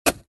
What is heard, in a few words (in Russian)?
zvuki lopaty